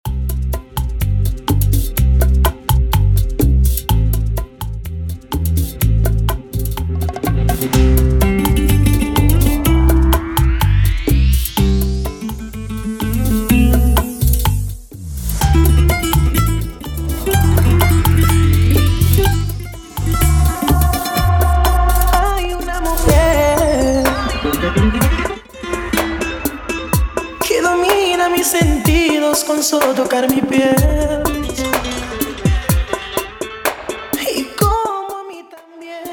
intro break outro 125 BPM

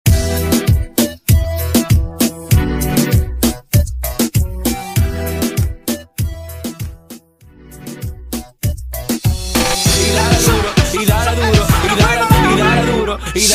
Intro Outro 98 BPM

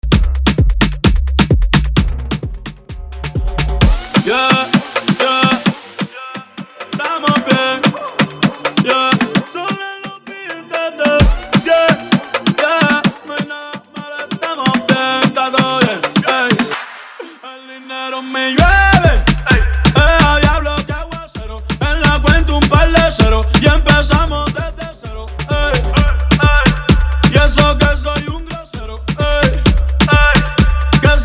afro house 130bpm

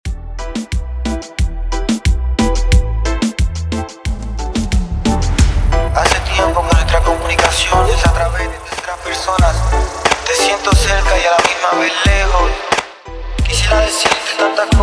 Intro Break Outro 90 BPM

